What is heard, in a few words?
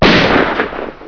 RIFLE